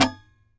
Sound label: SAZ TAP; Spectrasonics; Hans Zimmer Guitars VOL-2